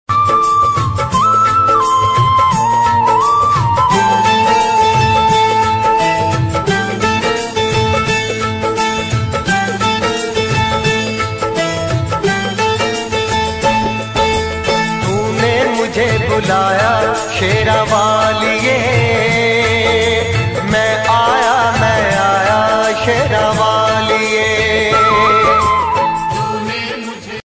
mata rani ringtone bgm ringtone hindi ringtone bhakti ringtone